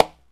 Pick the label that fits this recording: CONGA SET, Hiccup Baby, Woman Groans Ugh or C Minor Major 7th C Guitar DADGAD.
CONGA SET